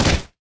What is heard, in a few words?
Thrust